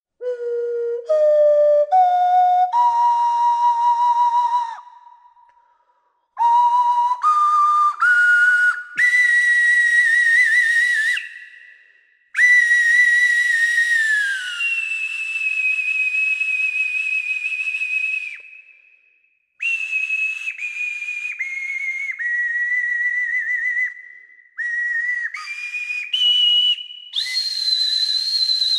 resonate

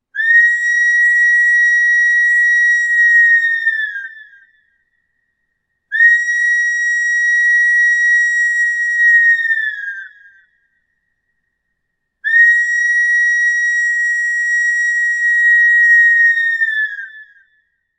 eagle

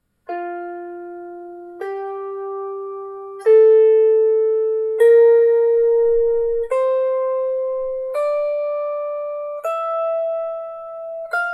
drone

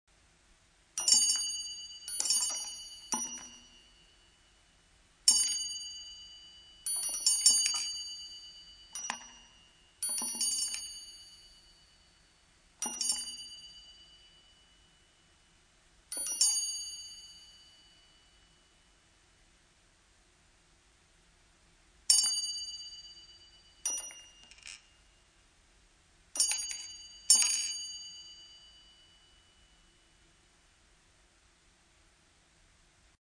chime

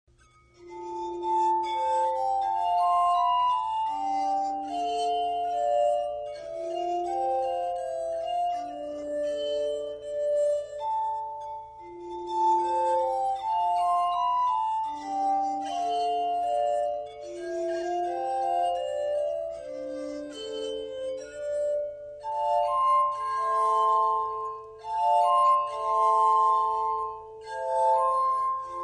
armonica

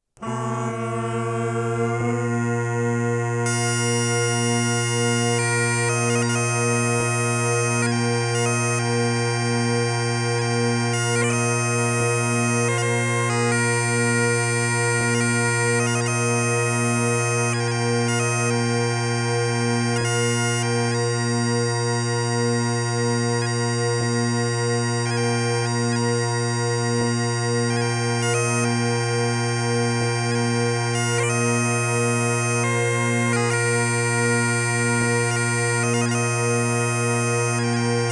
pipes

